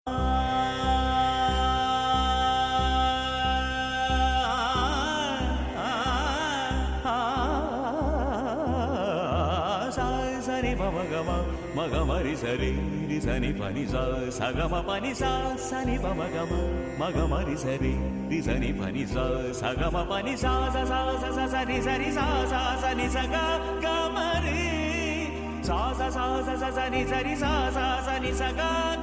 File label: Indra classical